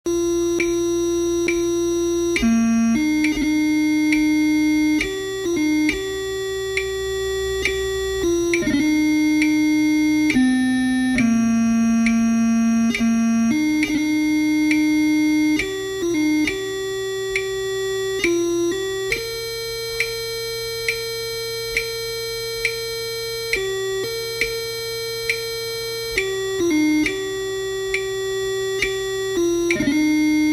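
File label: Amazing Grace - 68 bpm, bagpipes; highlanders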